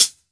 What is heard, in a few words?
soft hat sound f key